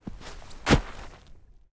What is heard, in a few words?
snow impact big hits semideep stomping jumping